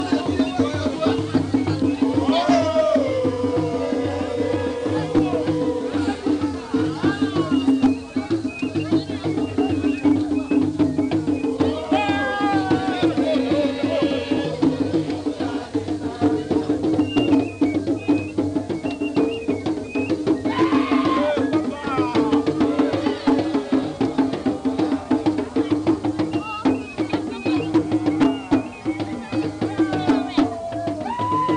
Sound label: Ghana Funeral Bolgatanga